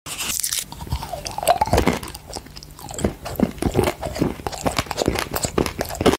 Eating Smarties; اسمر خوردن اسمارتیز

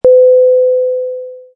elevator ping

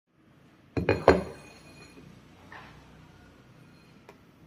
plate

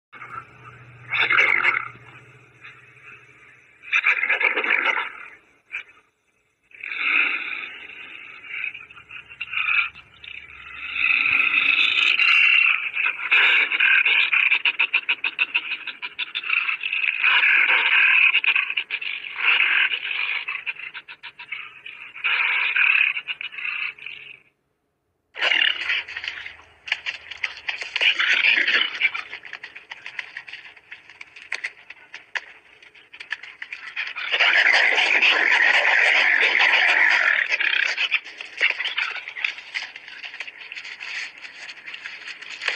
wolverine; ولورین

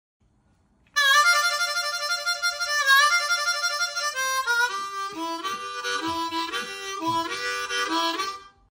Harmonicaa

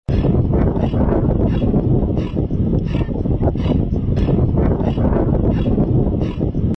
yak; غژگاو; یاک

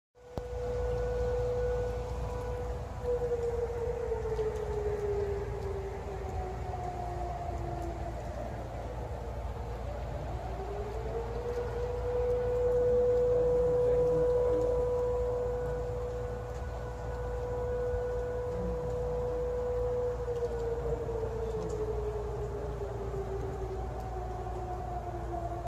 Alarm

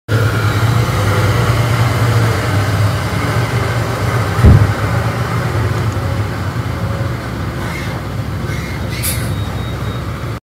Tow truck; ماشین یدک کش

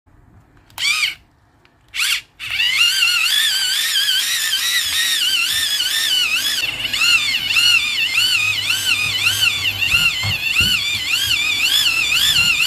Pencil Sharpener

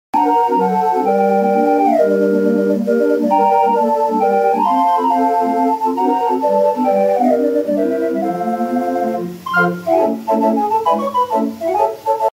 Calliope

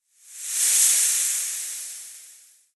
sound snake

